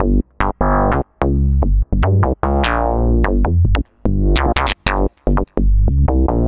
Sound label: Synth Bass